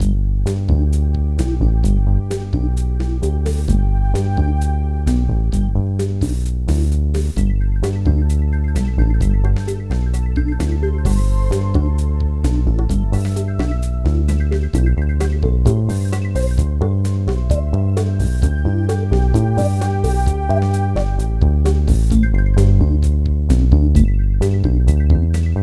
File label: Map Music; Bob the Fish 1.6